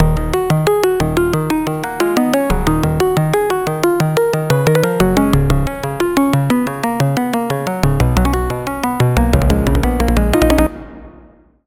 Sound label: Key; Routed Sound; Sylenth1 Expansion - Hyper Gamer Vol.1